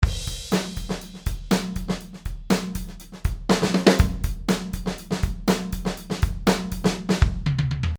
DRUM

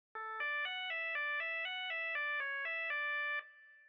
organ oboe

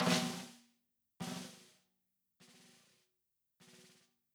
Snare drag

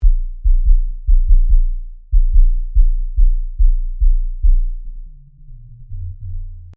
subbass

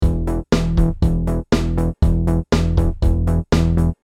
bass 16 beat

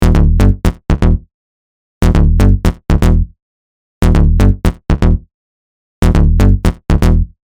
Bass

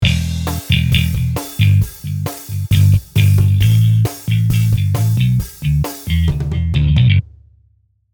Bass; Logic

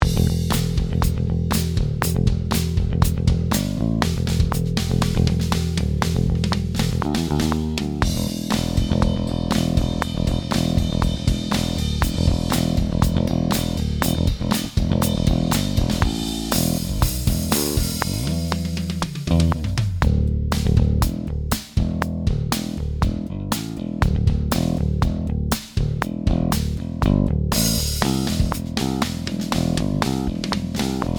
SR5 Rock Bass

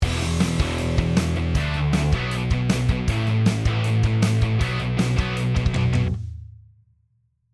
Dst GTR Stomp

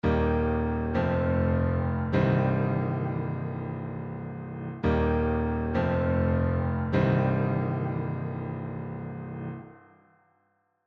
piano

